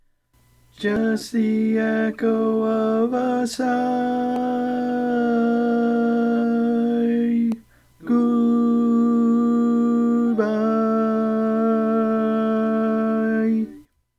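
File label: Baritone, barbershop tags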